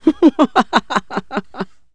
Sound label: VX LAFF; REDNECK; RAMPAGER